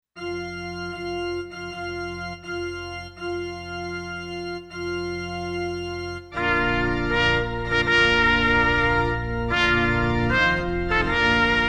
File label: Bridal Chorus